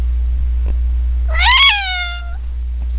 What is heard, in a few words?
nose meow